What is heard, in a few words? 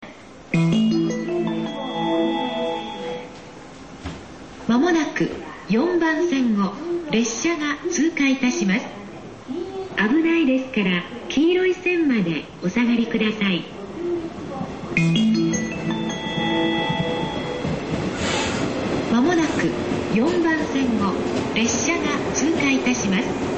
sekkin; chigasaki